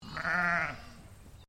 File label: Animal Sheep Dorper Baa; Sheep on a farm in Bright Victoria